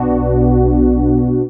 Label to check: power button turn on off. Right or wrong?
Wrong